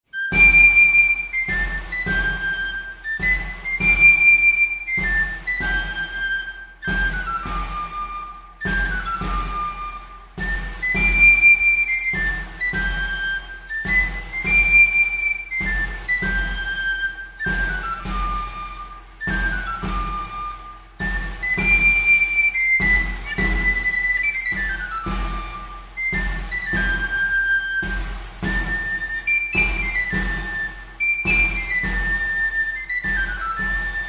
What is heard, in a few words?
Parado Tambourin; Parado dei Tarascaire; Academie Tambourin